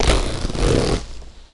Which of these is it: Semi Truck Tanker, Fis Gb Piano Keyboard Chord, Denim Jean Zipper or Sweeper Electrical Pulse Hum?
Denim Jean Zipper